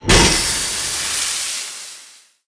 hydrant hit

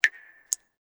city occasional

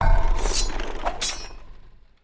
Build Chariot; Civ4 warlords

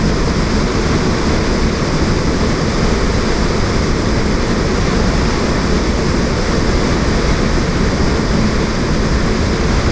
Aircrafts P-51D Plane Wind In; DCS

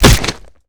long headbutt; Modern Warfare

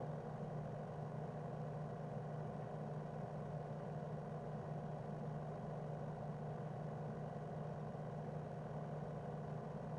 vending machine idle loop; Rust

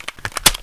Inf Weapons Foley 3rd; Red Orchestra 41-45

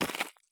jump gravel; Escape from Tarkov

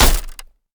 impact glass shield; Escape from Tarkov

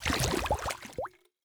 water bottle fill world; Rust

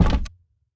barricade wood deploy; Rust

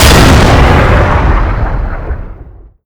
abrams 120mm fire; weap abrams120mm fire

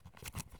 medkit draw; Escape from Tarkov

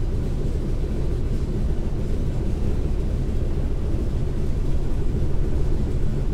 c46 cockpit, engines; War Thunder

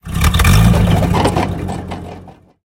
tank engine load; War Thunder

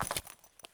pouch pickup; Escape from Tarkov

